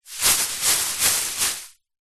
household vhs tape massive amount loose shake

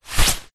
raincoat sleeve arm movement walking single; foley

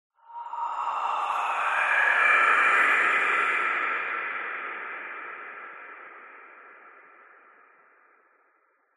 horror breath reverb ghost

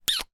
human kiss soft

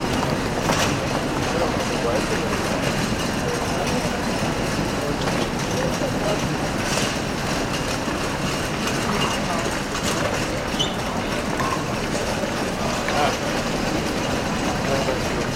ambience airport arrivals hall; stansted; sound effects one

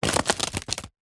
leisure wad of playing cards throw drop

